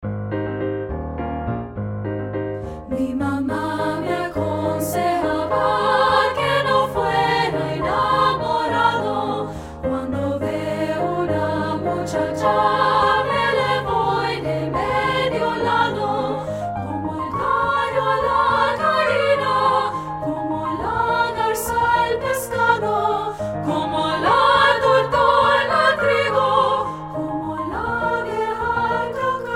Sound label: Latin American Folk Songs; Mi Mama